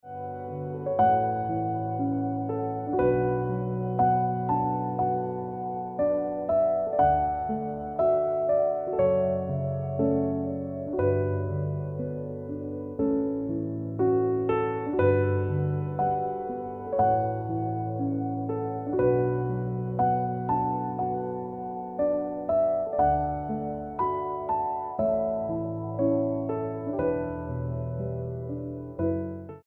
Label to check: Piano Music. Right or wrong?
Right